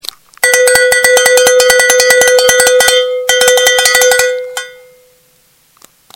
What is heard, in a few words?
bull bell